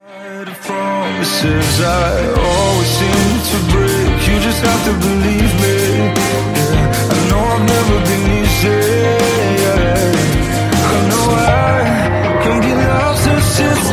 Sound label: English Ringtone